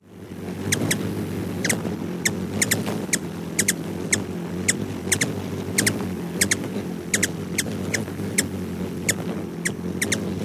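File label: chipping and wing noise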